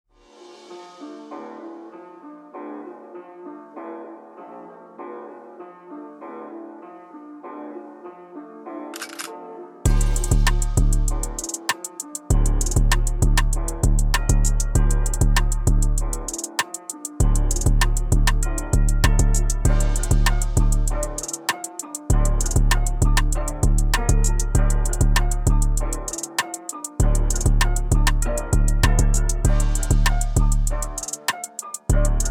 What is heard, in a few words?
Fmin 98 BPM